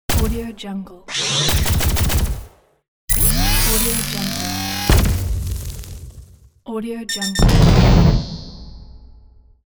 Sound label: energy weapons; sentrysound